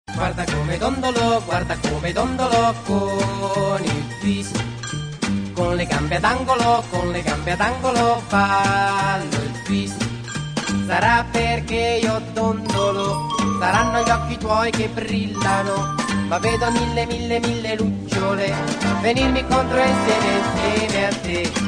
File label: italian twist tone